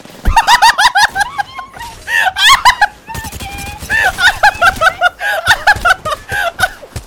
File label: laugh